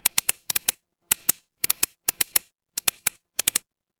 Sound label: office pen